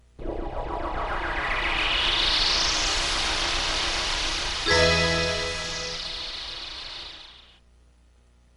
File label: Startup